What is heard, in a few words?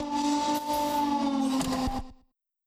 legs close, mining rig